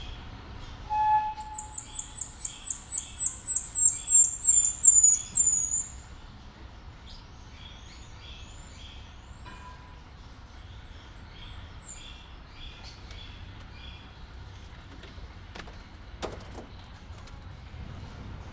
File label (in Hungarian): utána bement a házába, vöröshasú tamarin; debreceni zoo 2019